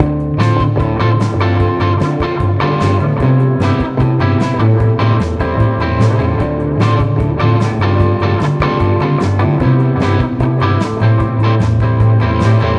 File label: Jazzy